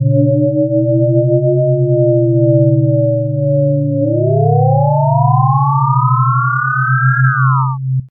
thicker, warble; Nyquist Example

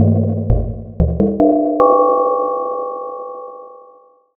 risset drum; nyquist; pmorales

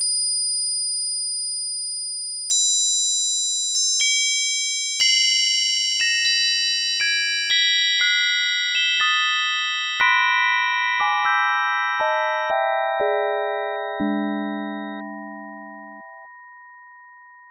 spectral; nyquist; pmorales

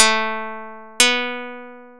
karplus simple; nyquist; pmorales

